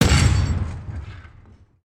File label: Impact; Anchors Away